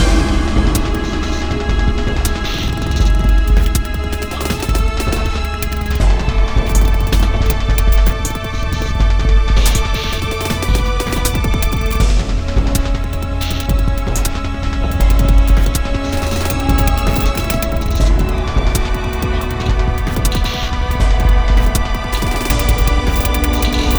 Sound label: Steelworks Medium; rive wreck hack die retry; ps4